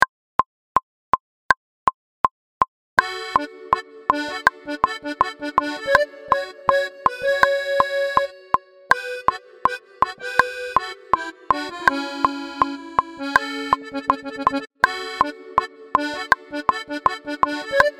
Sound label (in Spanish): ACORD, acordeon norteno